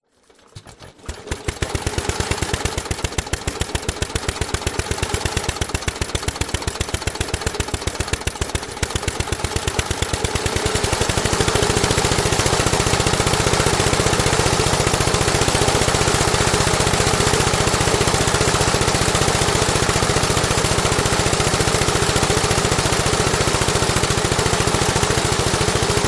lawn mower petrol Motor Mower 24in Start Run Passing; Ransomes; selectmood